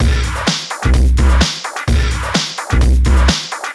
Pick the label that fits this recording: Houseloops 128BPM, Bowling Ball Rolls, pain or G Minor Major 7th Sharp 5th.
Houseloops 128BPM